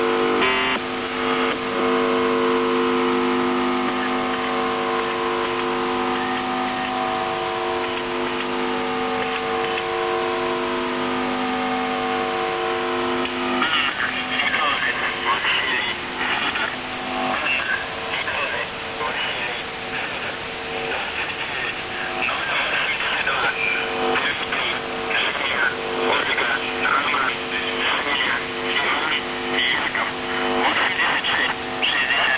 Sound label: buzzer usb msg